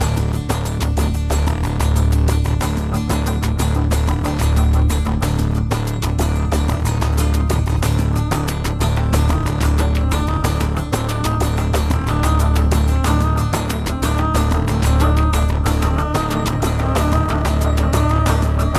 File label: Venice Combat; assassins creed ii